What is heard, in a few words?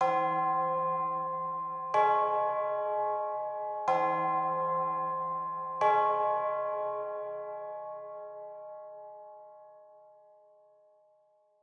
bells; schattenlander